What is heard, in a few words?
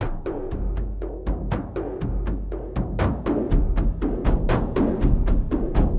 Big Drum, Frame Kendang; Sonic Foundry (Sony Creative Software) - Friendly Fire; Drums; Long